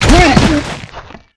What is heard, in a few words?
HellSoldier Die; Eft